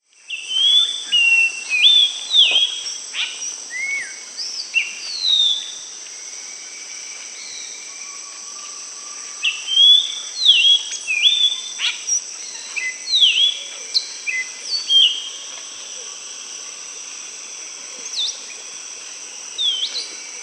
Epaulet Oriole, Icterus cayanensis, Nature's Songs, S. America